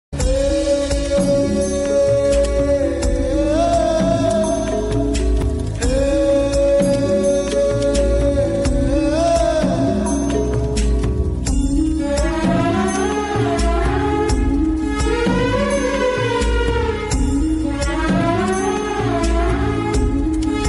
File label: background music telugu bgm; prabhas trishs